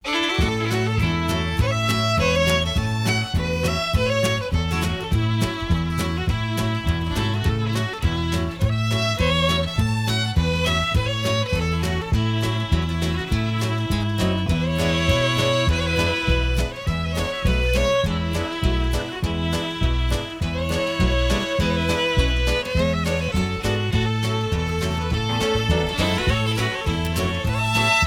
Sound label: fiddle